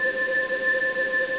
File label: Warp Loop; Chamber Of Sages; Zelda; OOT